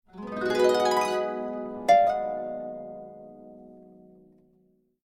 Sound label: Harp glissando, notifications; Samsung Galaxy Note 3